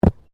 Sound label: Bodymovement Ground Impact Heavy; Body movement foley to simulate falls and deaths; Wrestling And Struggling Sound Effects